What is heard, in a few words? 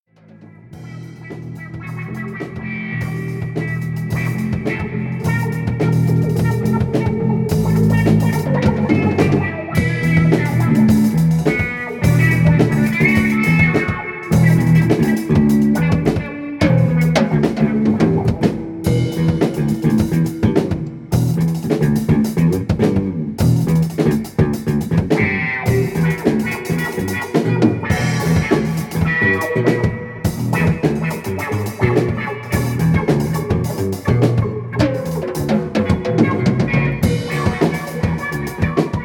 Jazz Blues